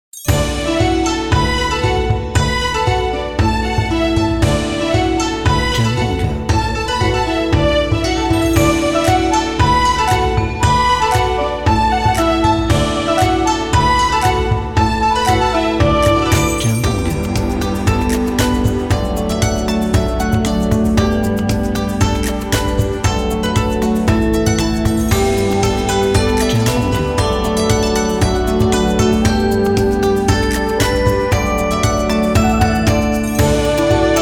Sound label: Chinese New Year Happy Asian